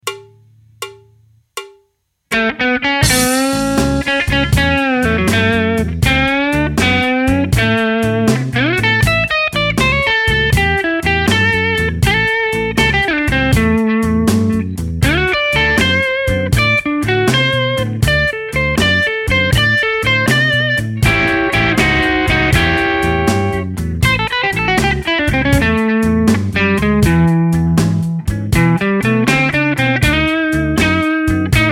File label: blues